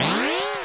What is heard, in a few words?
Electro FX; Ueb HE Electro FX